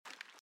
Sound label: armour Light Run